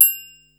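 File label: triangle open, Yamaha Motif ES drum machine; 300 Drum Machines; Yamaha Motif ES-Series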